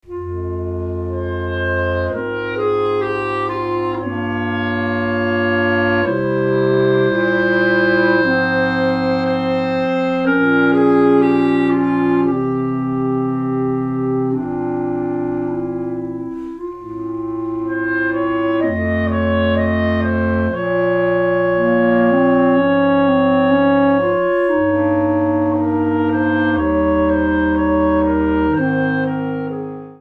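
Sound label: Prelude & Fugue, clarinet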